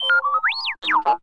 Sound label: Notification; Star Wars Tiny Death Star